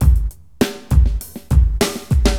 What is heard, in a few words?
Drums, AcidJazz Funk